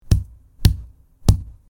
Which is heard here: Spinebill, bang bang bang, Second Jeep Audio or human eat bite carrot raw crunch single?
bang bang bang